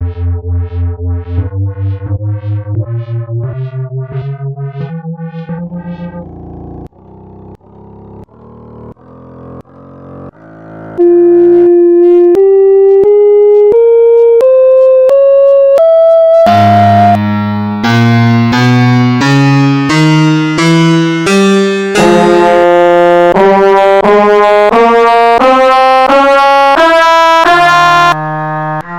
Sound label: F Harmonic Minor